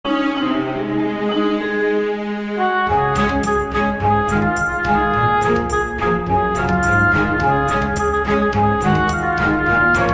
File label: Orchestral Remix